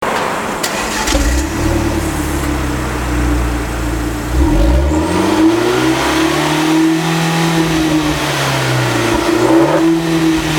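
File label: muffler blip; Giulietta; unicorse-italial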